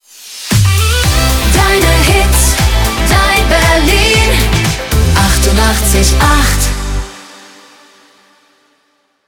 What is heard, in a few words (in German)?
Short ohne SV